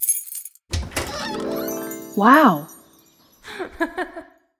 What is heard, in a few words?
Sonic Logo